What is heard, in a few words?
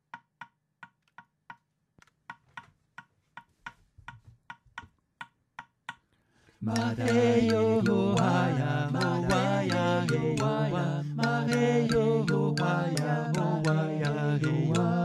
xylo vocal